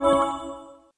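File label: unlock, alcatel phone sounds; Рингтоны, звуки будильника, уведомлений и системные сигналы смартфона Alcatel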